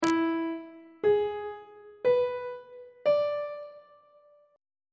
g flat minor 7th chord 7 is f flat dominant 7th on treble clef